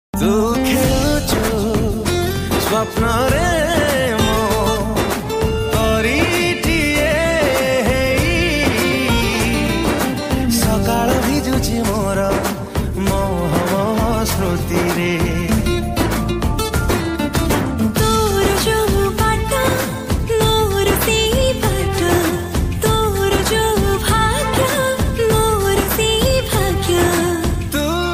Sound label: Odia Song